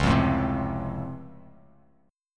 C sharp Augmented Major 7th down Bass Standard, chord; C#+M7; strummed; JGuitar